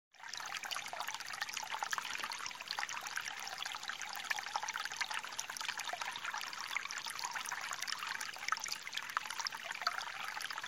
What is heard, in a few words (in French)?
Ruisseau forestier